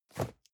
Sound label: cardboard box medium place slide; objekte